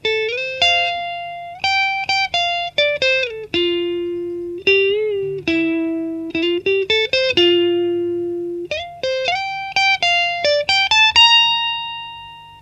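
Strat clean neck, Neck pickup, Канал clean Fender Frontman в линию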